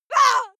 female lunatic shout short; schreie; menschen

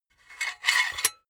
silicone gun empty pusher push slow; soundpool

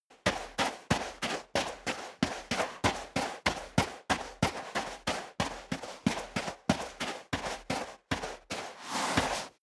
step boots sand run sequence

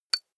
small metal impact soft high hollow

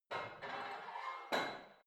barbell heavy plate put on creaky reverby; sport freizeit; fitnessstudio

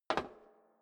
step boots metal rattle single stair down; Footsteps; schritte

